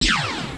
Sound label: Missile Launch; Technoids 1.0